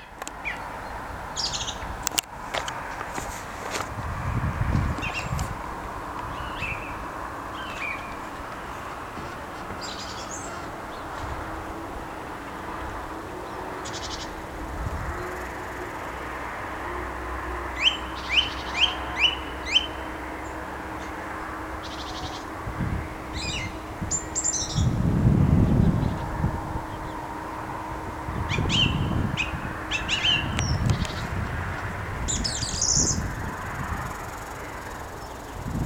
Song Thrush; Song Thrush Sings

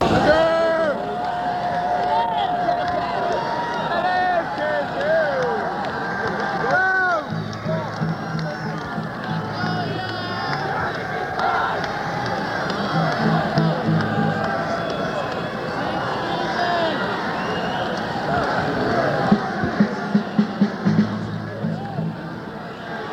Crowd; Sony Stereo ECM

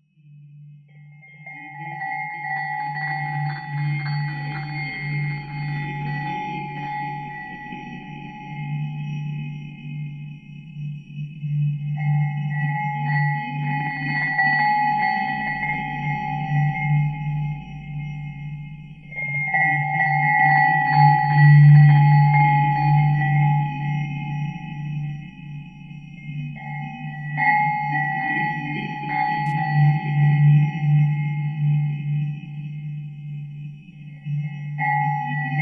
bells of horror; Uros Tosic

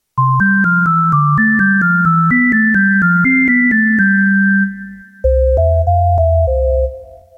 Mini Sine, Kawai K1; Bank 2 card A

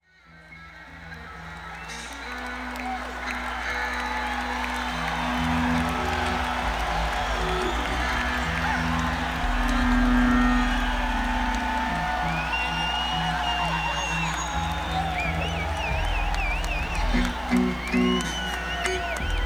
Crowd Intro; senn421

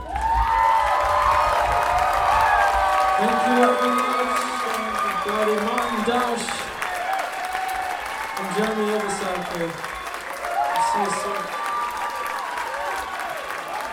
applause; Around the horn

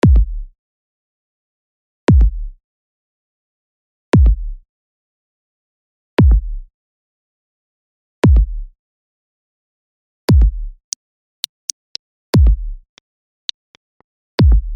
Rythmic

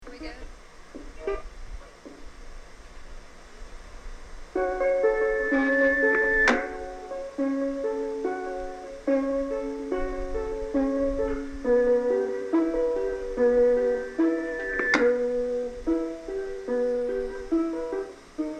guitar and feed; 808

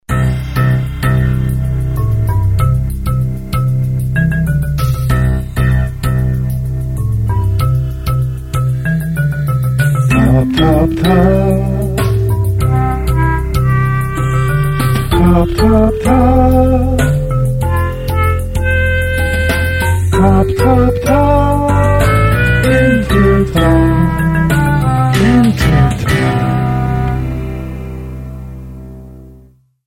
Jingle; Intertop

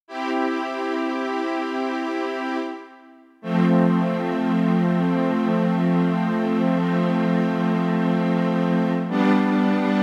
String Ens, Kawai K1

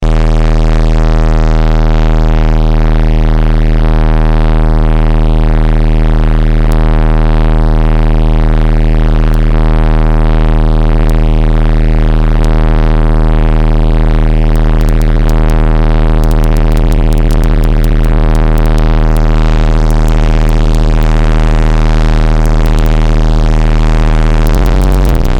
flute; ronins flute

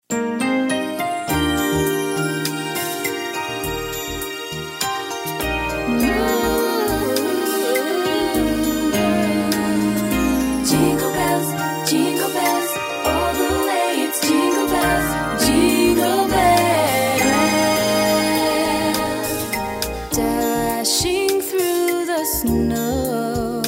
Jingle Bells

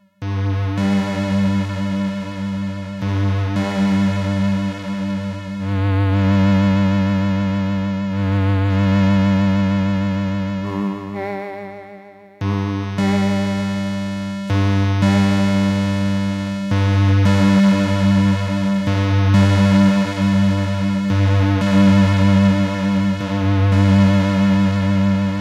Analog Zynt, Kawai K1

